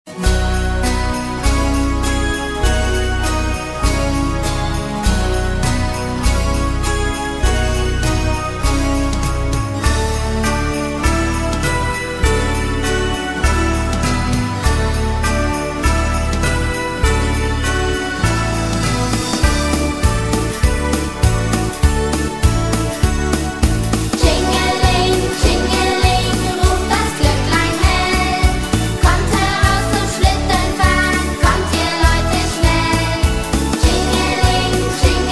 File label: jingle bells